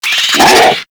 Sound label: Infested Charger Alert; Warframe